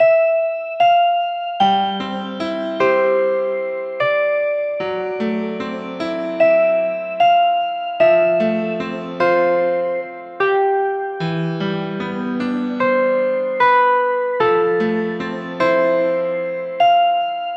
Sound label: BGM; Heroland